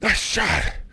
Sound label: die; svencoop; officer